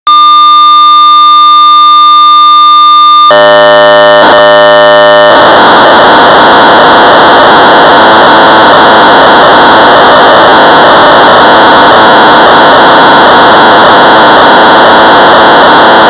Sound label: Sorcerer 1200 baud; Star Wars; BASIC